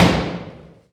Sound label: Backboard Hit